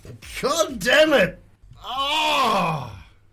wyld rage

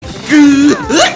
hillbilly laugh; dunkey

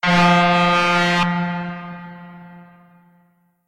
Mack truck

